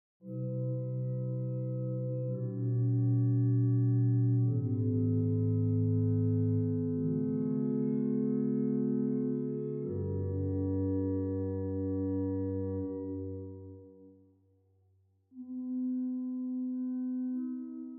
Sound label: cor de nuit, hauptwerk